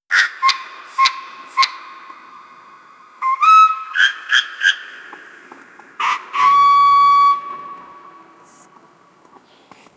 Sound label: fanfare